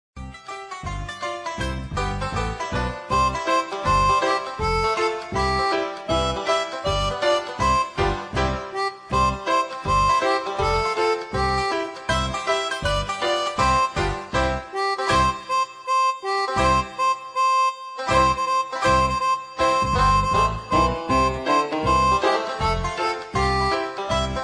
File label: instrumental